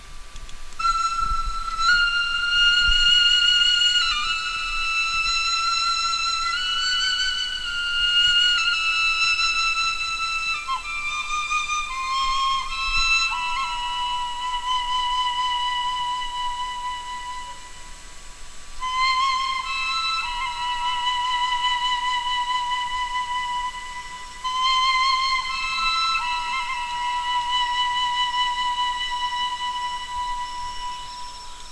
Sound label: flute; miyu